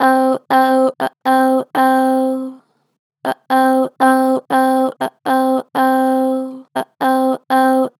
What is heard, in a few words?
Oh Oh, Vocals, Pitch Flat Gentle; Harmor Vocals